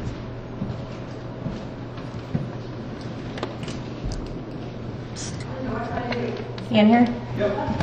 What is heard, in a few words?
Pssst